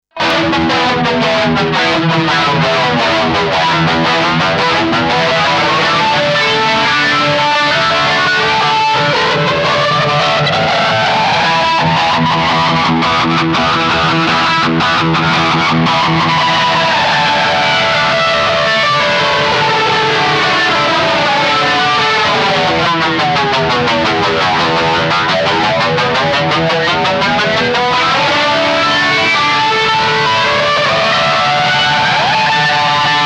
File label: Jet flanging Effect; Maxon FL9